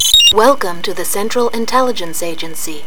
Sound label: Mission Tone